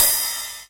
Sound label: ride